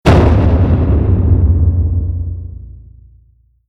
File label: Huge Metal Impact Hollow Deep Stinger Evil Distorted; Sound Design; Stinger Sound Effects